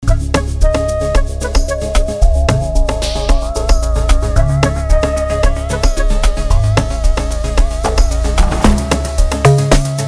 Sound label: Amapiano; Trend Setter